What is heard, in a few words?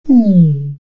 shake nomatch